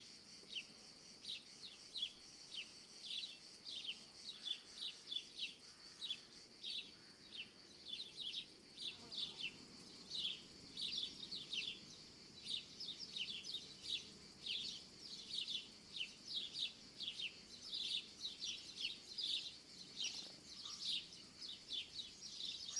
summer in the fields day morning killing little birds near owl cicadas bees and insect; insects; fvtt sonniss gdc audio